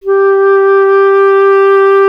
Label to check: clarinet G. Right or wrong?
Right